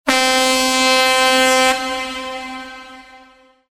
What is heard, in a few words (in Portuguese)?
buzina